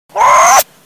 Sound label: doof